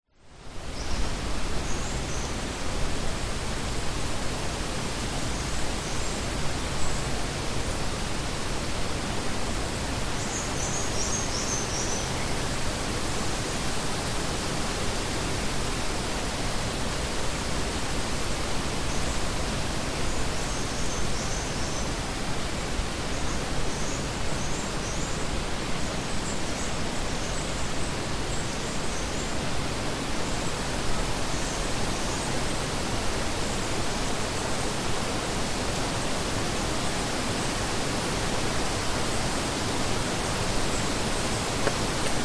nature forest ambience wind trees birds leaves fall to ground autumn